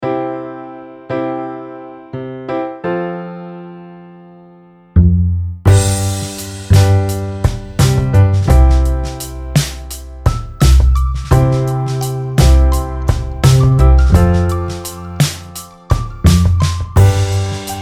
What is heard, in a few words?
I Love to Pray instrumental